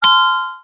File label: duck pickup, halloween; Garry's Mod; tf2